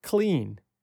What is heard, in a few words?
clean